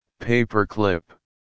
paperclip

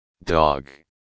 dog

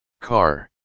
car

